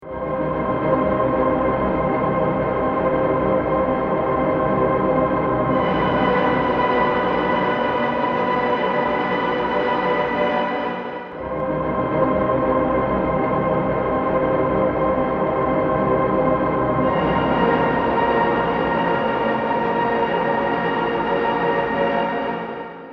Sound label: space